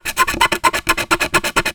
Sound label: plastic thin phone cover scratch with knife; foley; zapsplat